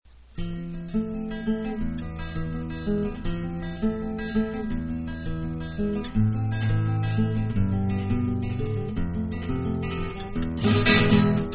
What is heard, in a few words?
Rumba